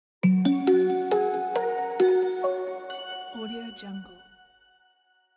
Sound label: short logo intro